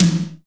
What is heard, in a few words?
TOM, Dance